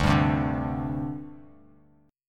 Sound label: D 7th Suspended 4th, chord; D7sus4 strummed